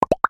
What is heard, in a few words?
click short confirm; summer pockets (pink)